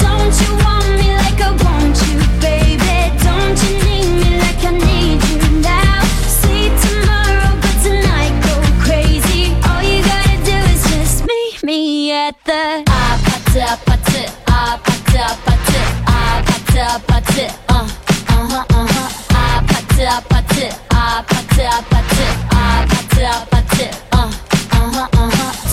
music loop; actmod; fortnite; emote; studs

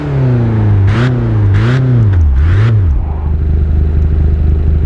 slowdown highspeed, fiat 595; vehicles cars; lwcars

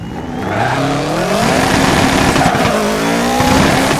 fast, subaru BRZ; lwcars

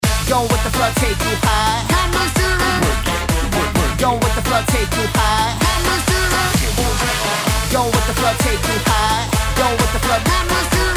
victory dance loop; actmod; pubg

